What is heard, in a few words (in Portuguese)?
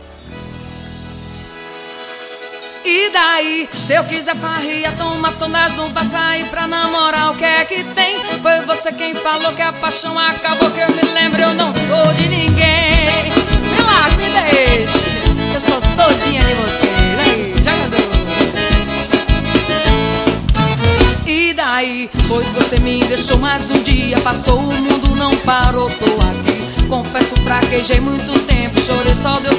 forró